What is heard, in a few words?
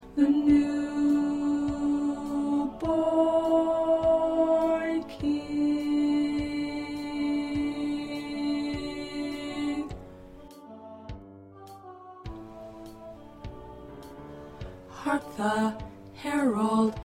Soprano